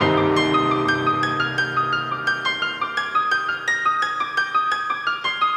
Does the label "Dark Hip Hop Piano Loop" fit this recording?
Yes